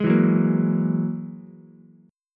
G sharp 7th Suspended 2nd Sharp 5th, Ukulele; G#7sus2#5; strummed; JGuitar

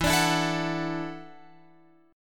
E 7th Suspended 2nd Suspended 4th Guitar Open F; strummed; x,11,14,11,12,13

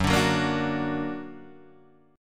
Gb Augmented Guitar Open D

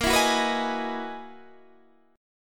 F sharp 13th A sharp

